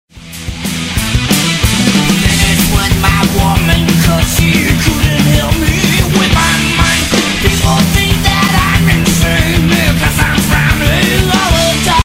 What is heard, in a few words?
tecnohard